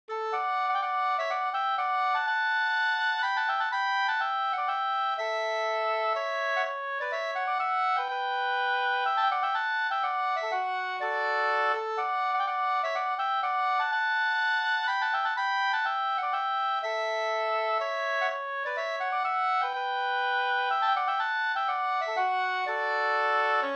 hamburska

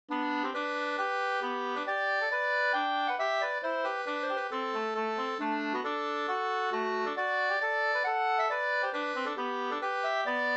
folkdans Ekebypolska; hambopolska till folkdans

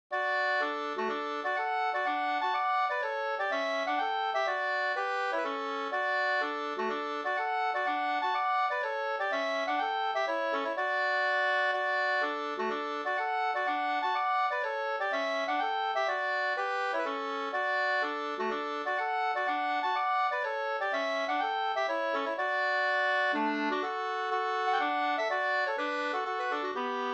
polska, 2st; AP Andersson Himlinge e AG Eriksson Hagerbo

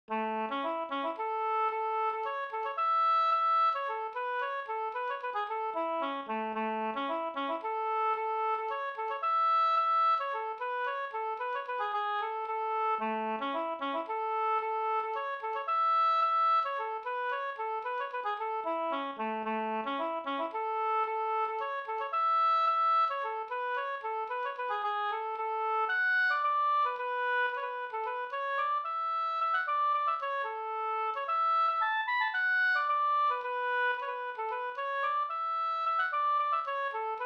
slangpolska

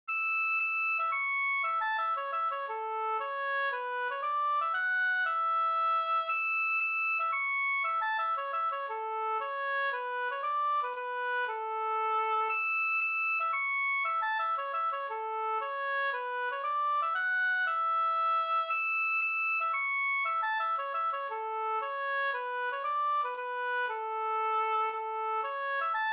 polska; e Johannes pa Fjalle Ds

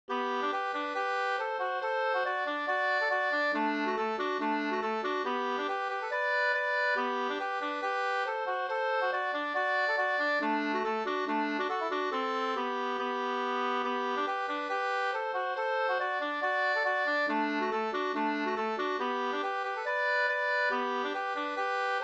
schottis G dur

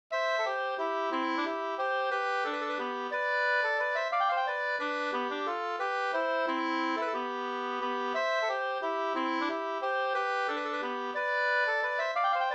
vals C dur

